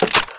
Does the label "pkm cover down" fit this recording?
Yes